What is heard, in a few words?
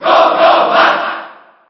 Cheer JP; Little Mac; SSB4